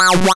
Synths F#; Vengeance Samples

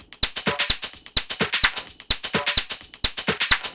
Drum Loop, 128 BPM; Vengeance Samples; VTDS2 Song Kits

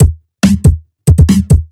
Breakbeat; Vengeance Samples; VEC2 Loops

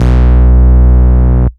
Root B, Ultimate Bass; Vengeance Samples

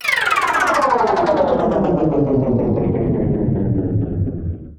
Downlifter

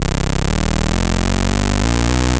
Arp D Line, ARP Odyssey; musicradar-synth-samples

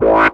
Synths C; Vengeance Samples

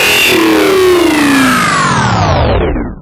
Reverb Athmospheres, FX Vocals; Vengeance Samples

